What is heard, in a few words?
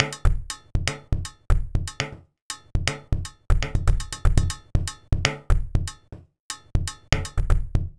Minimalism, Processed Percussion